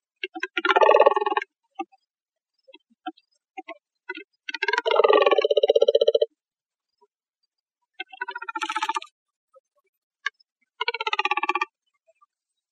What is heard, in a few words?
Pelicaniformes; AZFO; deviche